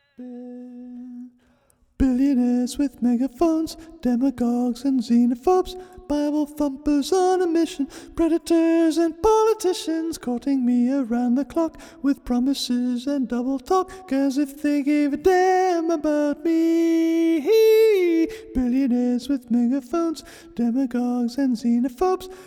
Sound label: final chorus just harmony